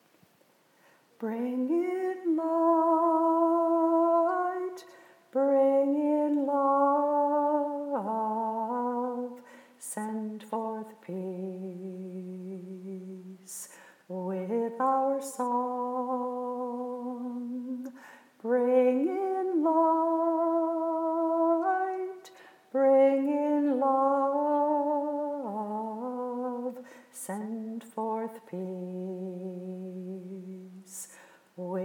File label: solo, choir